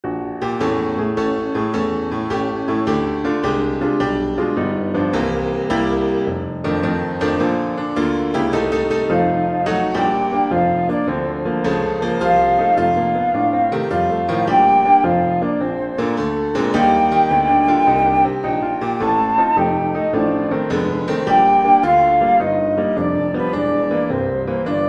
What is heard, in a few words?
beside seaside flute